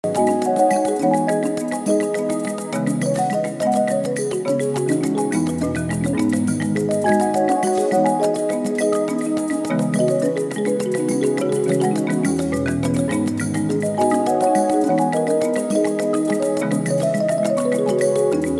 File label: African Marimba